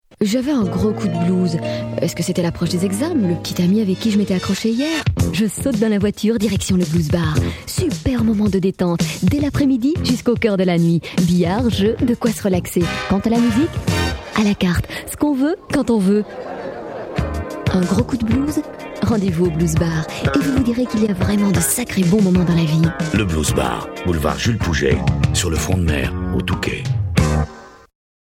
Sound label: Blues Bar